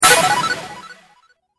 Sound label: combo; popcap; bejeweled